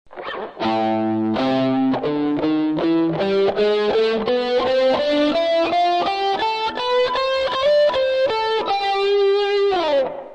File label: embellished box